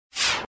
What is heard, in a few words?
alert